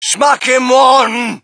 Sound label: crowd, shout; Mike Tyson Heavyweight Boxing